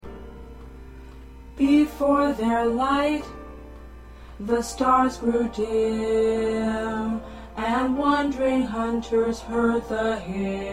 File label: Tenor, choir parts